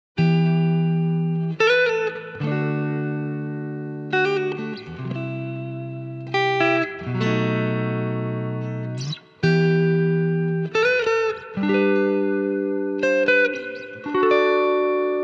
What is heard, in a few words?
6V6 Tele Glass